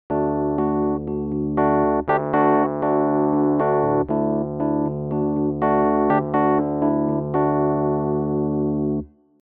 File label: suspended fill